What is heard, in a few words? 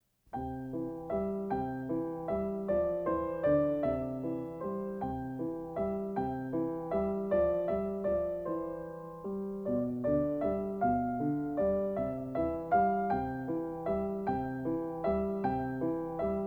cuckoo